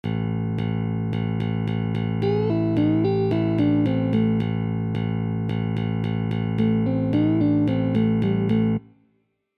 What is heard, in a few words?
Phrasing Example